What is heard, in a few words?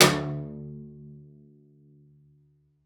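CELLO spiccato D2; 90 s Sample CDs; Xsample VOL-5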